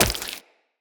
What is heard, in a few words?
Wall Jump Flesh; Footsteps; Wall Run; Characters; Tenno